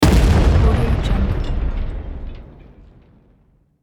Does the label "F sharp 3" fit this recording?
No